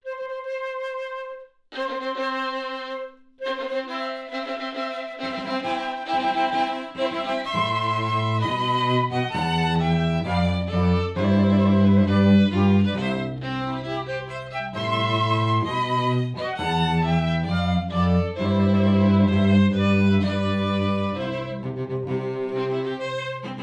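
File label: Wedding March Mendelssohn Flute Trio; classical string quartet; Columbus Musicians Llc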